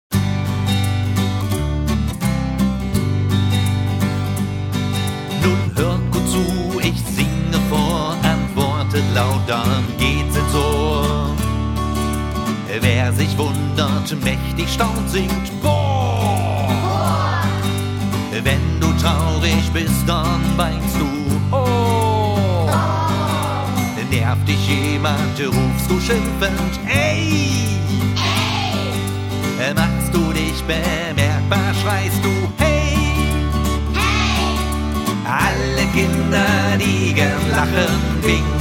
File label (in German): Alle mal BOAH rufen